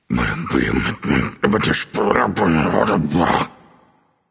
grumbling; smite; voicepack

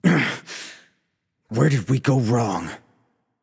boohoo; smite; tsukuyomi

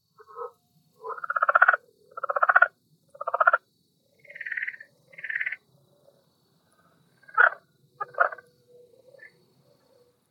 ambience insect; smite

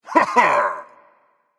yay berserker; cuchulainn

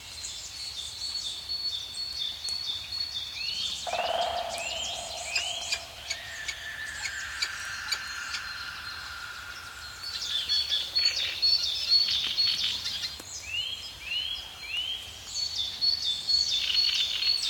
ambience bad wildlife bed 2ch; smite; conquest

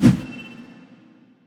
small fire; smite; guanyu

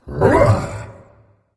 grunt attack; Smite; cerberus

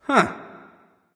confused; erlangshen

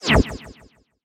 staff fire; smite; ahpuch skin10

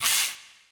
armor; smite; arachne skin9

